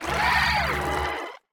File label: Jumble Guts Waning Roar